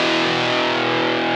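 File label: distorted guitar; jazz jackrabbit 2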